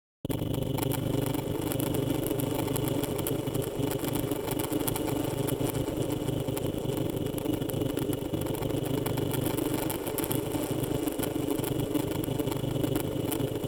Bitcrushed, Ambient; SFX; Ambient - General

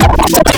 Audio Corruption, Electronic

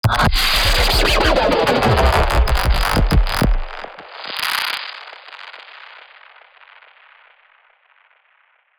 Broken Tape; SFX

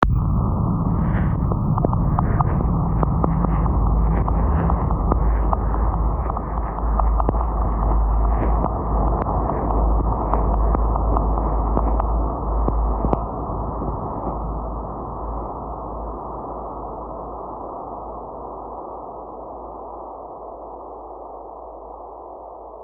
Radiation Heavy; SFX; Ambient - General

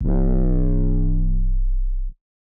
808, Bass

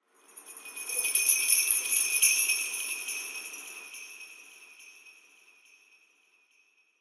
Jingle Bells

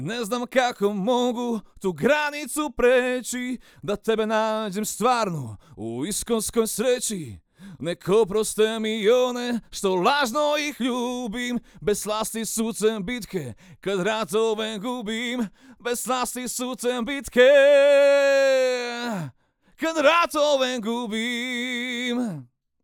AKG Cardio; Reaper project

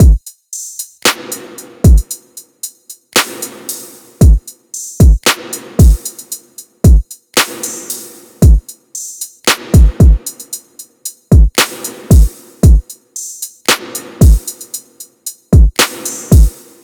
Classic Trap 114 BPM, loops

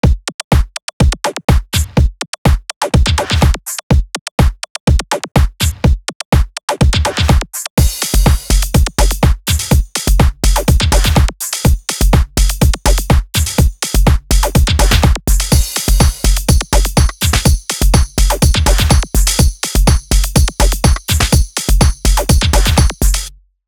124 BPM, Loops

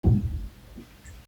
Distant Hit; SFX

